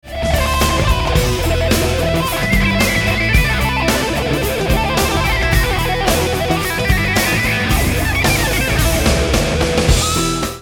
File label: legato